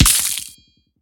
Sword Impact

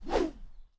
Bamboo Swing

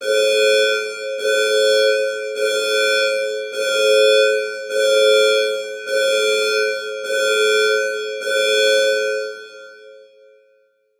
Scifi Alarm Danger

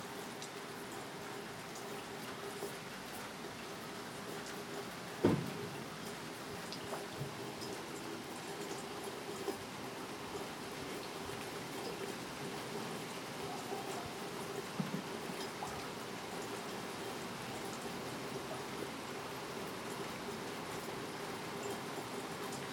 Rain Dripping From The Rain Gutter

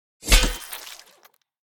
Sword Hit Flesh; Tyrael

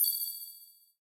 Echoed Blip

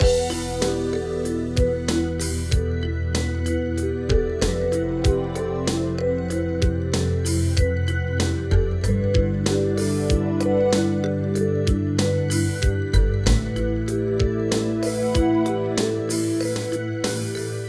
Loop Alternative

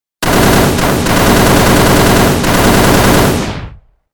Machine Gun

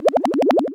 Cartoon Drowning

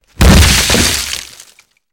Axe Pacts Wood W Crash

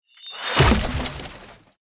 Shield Breaking With Bludgeon

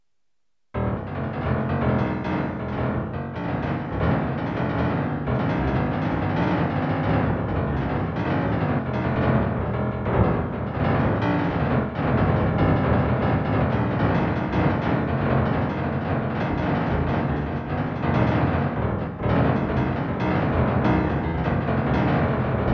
Faster Bass Piano

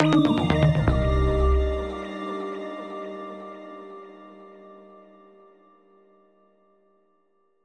News Cue Bumper

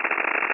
Flicker

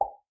Pop

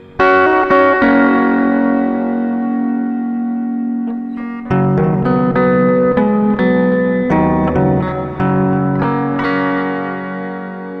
Guitar Coda Reverb

